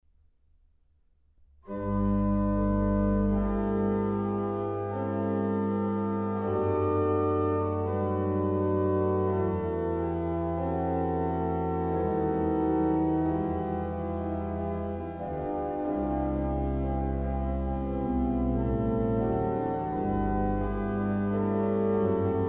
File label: Organ; Hinz Organ Holland